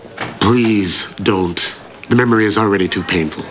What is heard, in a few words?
painful